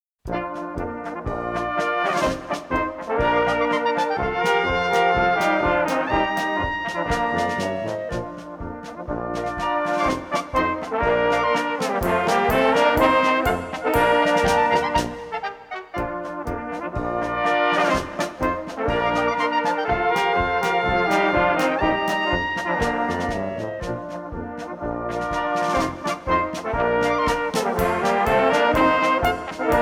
Dorffest Polka

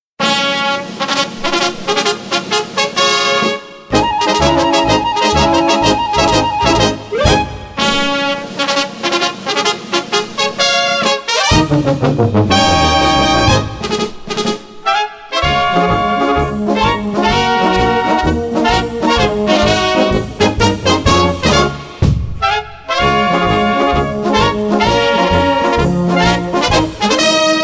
Marsch

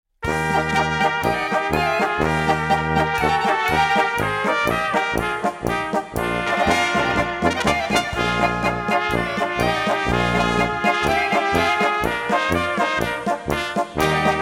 Rudi Polka

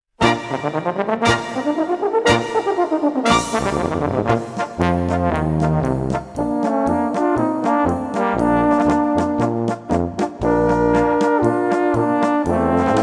Baritone In Der Nacht

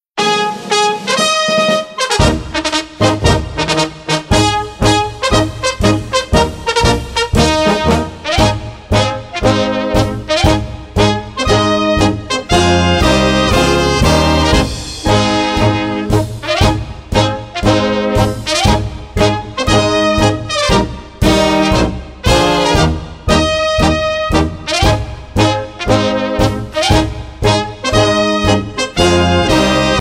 Gemmi Marsch

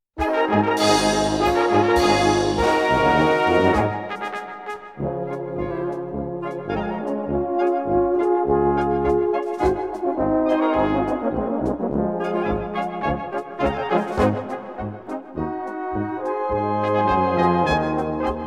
Brünner Polka

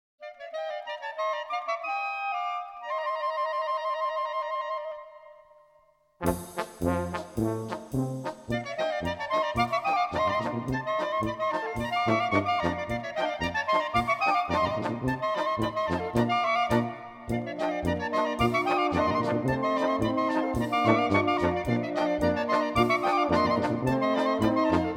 Zauberhafte Klarinetten

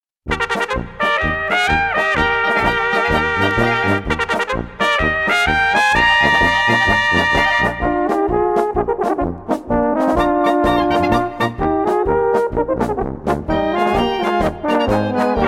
PFEFFERPOLKA

